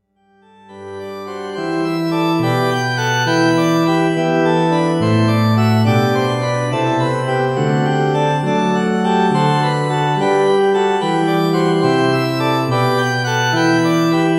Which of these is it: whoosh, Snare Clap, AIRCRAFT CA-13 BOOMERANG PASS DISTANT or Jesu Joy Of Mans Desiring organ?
Jesu Joy Of Mans Desiring organ